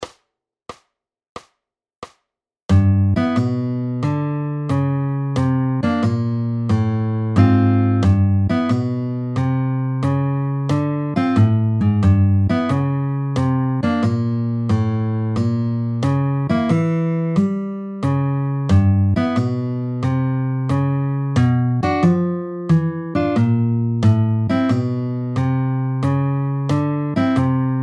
Jazz-Blues with Walking Bass - Key of G - 90bpm

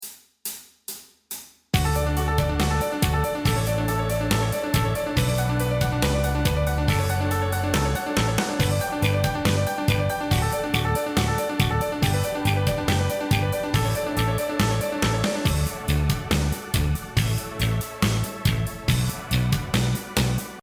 Cannon In D Backing Track

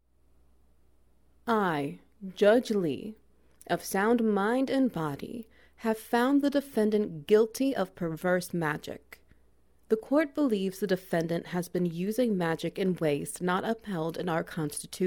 Wet Noises; Verdict

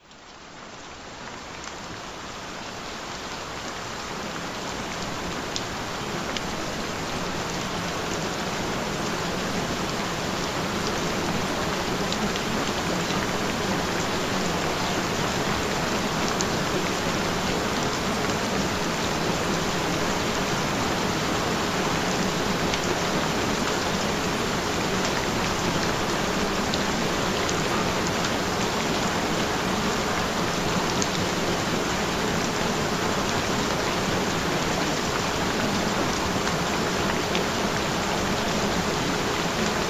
Relaxing Thunder; Beautiful Tiny Feminine Rib Cage; Waist Shrinking and Toning Frequencies

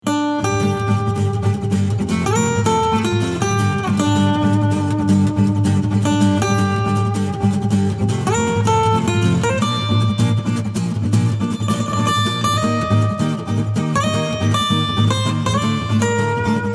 Acoustic; Desolation Canyon

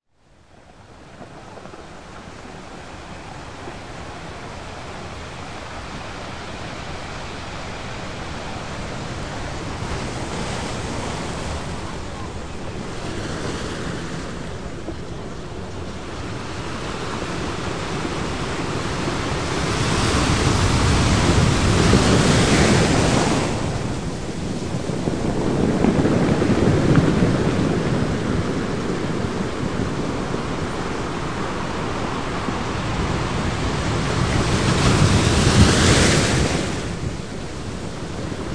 Relaxing Waves; Get Your Desired Face Fast; Ideal Beauty; Collagen Building Frequencies

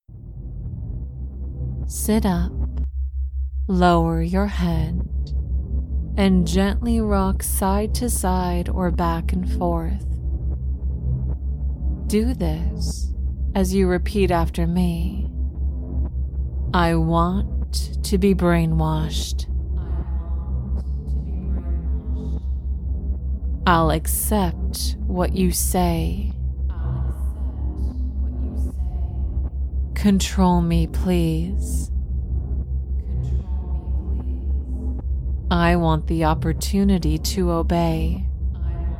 Distorted Droning

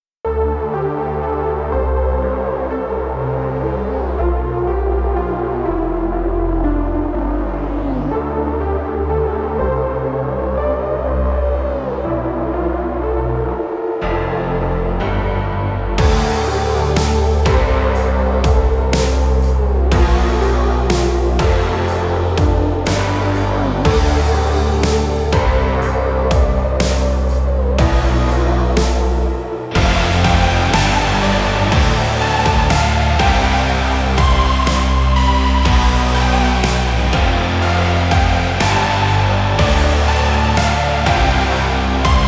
Melancholia 122bpm

